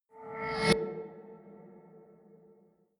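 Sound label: musical swell short reverse, drones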